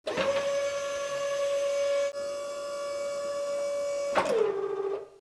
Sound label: tow truck